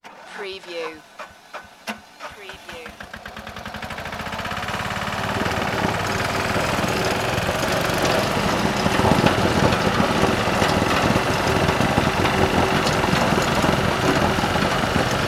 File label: road motor grader